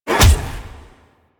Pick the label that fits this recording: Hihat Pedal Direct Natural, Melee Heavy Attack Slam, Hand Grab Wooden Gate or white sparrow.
Melee Heavy Attack Slam